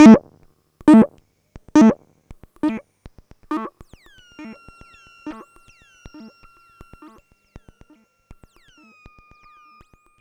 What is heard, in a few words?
System Sweeps; Sonic Foundry (Sony Creative Software); Underground UK House & Electro